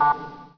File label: rocket count; svencoop; rngremake